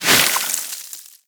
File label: Sword Elemental Ice Whoosh; Enemies; Weapons; Warframe; Teshin